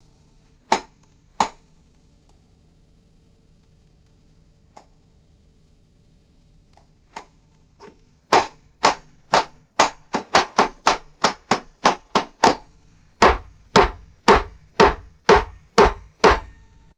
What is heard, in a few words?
small metal tack hammered into wood